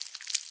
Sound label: step, silverfish; minecraft